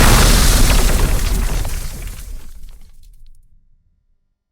Explosion hit wood; Hovl Studio; SFX Battle sounds; WhiteMan Unity2D

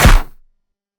Bullet hit stone; Hovl Studio; SFX Battle sounds; WhiteMan Unity2D

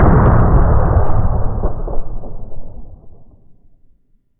Huge explosion from bunker; Hovl Studio; SFX Battle sounds; WhiteMan Unity2D

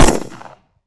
Gunshot; Hovl Studio; SFX Battle sounds; WhiteMan Unity2D

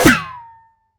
Bullet hit metal; Hovl Studio; SFX Battle sounds; WhiteMan Unity2D

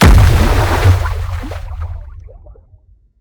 Explosion hit water; Hovl Studio; SFX Battle sounds; WhiteMan Unity2D

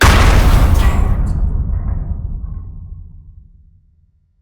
Explosion hit metal; Hovl Studio; SFX Battle sounds; WhiteMan Unity2D

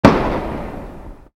Firework Boom; SFX; Dino Love Simulation

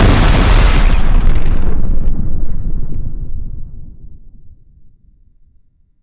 Huge explosion; Hovl Studio; SFX Battle sounds; WhiteMan Unity2D

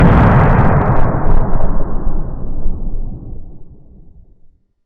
Huge explosion from bunker; Hovl Studio; SFX Battle sounds; WhiteMan Unity2D

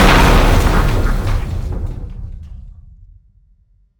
Explosion hit metal; Hovl Studio; SFX Battle sounds; WhiteMan Unity2D

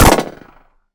Muzzle flash multi; Hovl Studio; SFX Battle sounds; WhiteMan Unity2D

